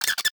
BAT ATTACK; SFX; DTLProto4

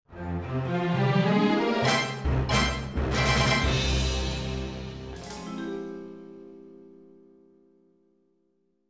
WIN

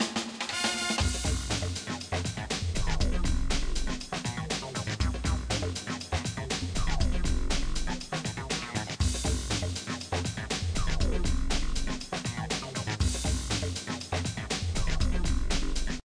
unused music track; JP Dinobattles

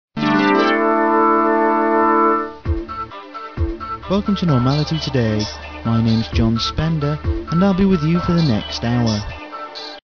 jingle; Normality Today

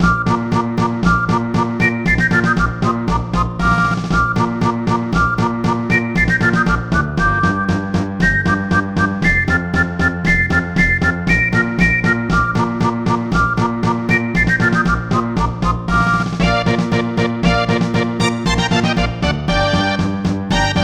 Unused Music

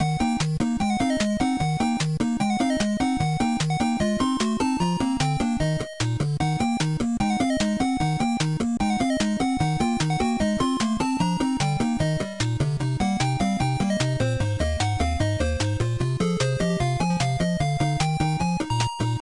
game over; Monster Party Proto

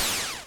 old shot; Galaxian (NES)

